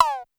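Cowbell 808 Down, Drum Machines Clavia DDrum-AT Pitch Envelope; 300 Drum Machines; Pitch Env Various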